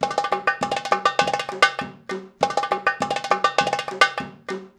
PERCLP, ArabianTraditions; USB Soundscan vol.43; AKAI

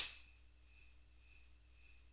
Tambourin, Roland JX-305

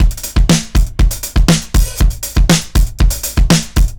CRUNCH 120, DRUMS LOOPS; BASEMENT DRUMS VOL 2

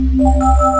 RESONAINS, Electro Perc; E-MU Formula 4000 Series Vol. 3; Analog Odyssey

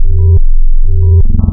ECHOS, wavetables Classic Synths

